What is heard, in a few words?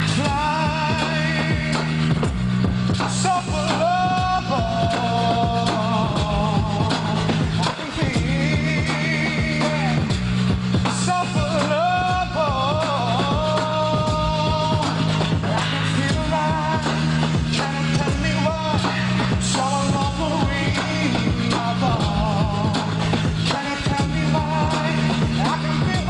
Beat Boxer